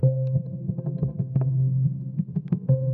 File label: coin ding; sound effects